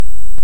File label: Hydrophone; OneOcean